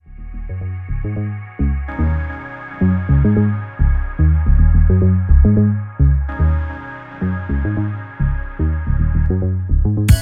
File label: Piano and Dream world